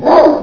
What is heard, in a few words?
dog bark